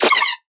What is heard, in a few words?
Pewpew